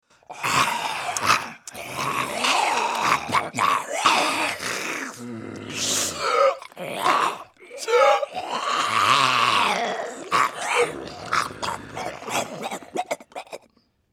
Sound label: Group Of Zombies Growling; زامبی